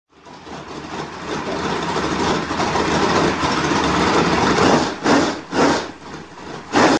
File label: super dirt late model idle and rev